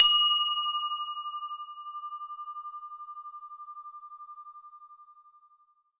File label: one pretty bell; Bells